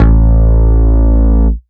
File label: Bass Root A